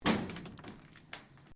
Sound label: Metal Plate Impact; Material components to create more complex sounds; Materials